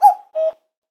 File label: cuculus canorus cry